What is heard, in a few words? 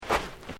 Footsteps Boots Sand Step; Footstep Foley; Human